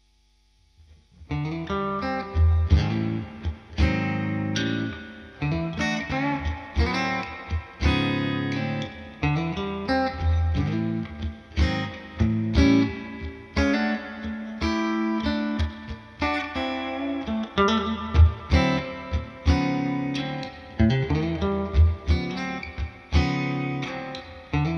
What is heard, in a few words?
clean, strat vintage